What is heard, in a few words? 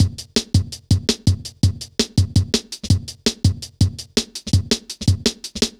Skippy, Drum Loops, Jungle